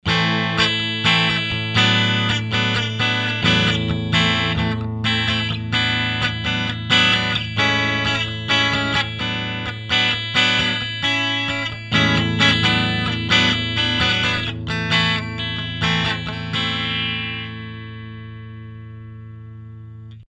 Universal Audio SC 1 E Gitarre 2 Preset Electric Guitar RB 77DX RCA77DX